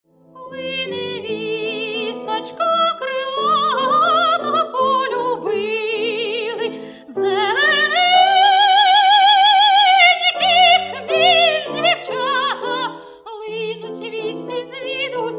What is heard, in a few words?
vocal miniatures